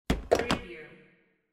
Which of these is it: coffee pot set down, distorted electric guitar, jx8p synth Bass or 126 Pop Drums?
coffee pot set down